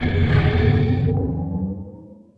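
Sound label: system reactor power increase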